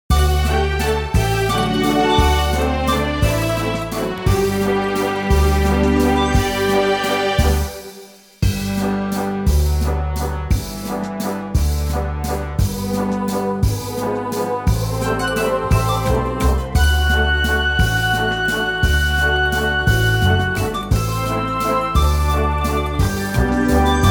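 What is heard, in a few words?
G, backing track; key - G - vocal range - F# to A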